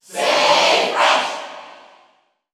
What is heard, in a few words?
Cheer English; Inkling; SSBU